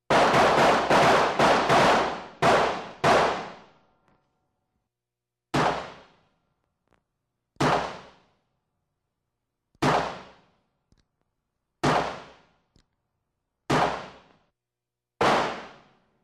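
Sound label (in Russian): 2 пистолета в комнате стреляют; S i W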